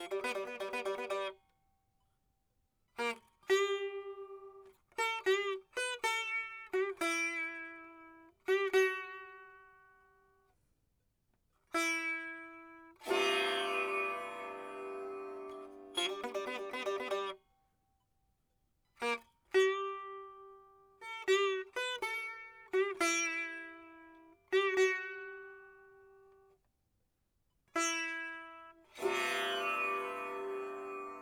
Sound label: sitar